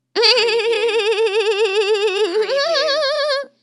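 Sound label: Annoying Kid Laugh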